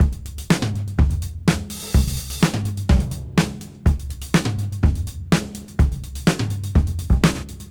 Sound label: Disk 125 BPM; AMG - Keith LeBlanc Phat Butt Jams